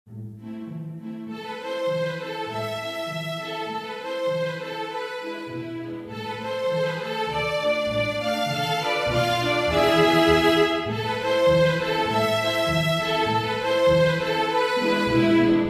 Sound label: string orchestra